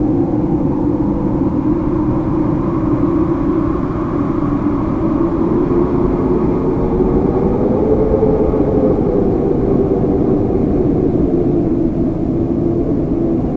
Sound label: wind, ambient; STALZONE